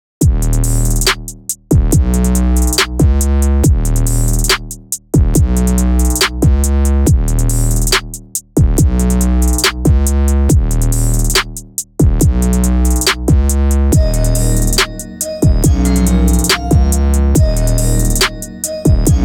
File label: Golden Hip Hop